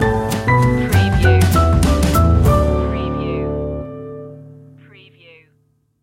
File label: here we come a-wassailing jazzy stinger